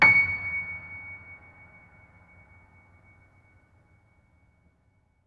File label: Note, Piano; Piano Miscellaneous